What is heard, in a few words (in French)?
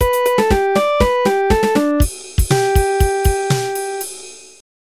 carillon